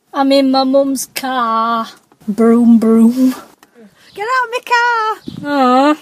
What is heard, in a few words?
aww